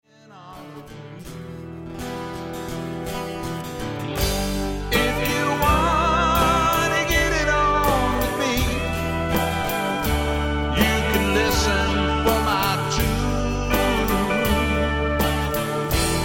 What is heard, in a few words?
Jig